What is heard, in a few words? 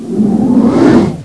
conjuration fail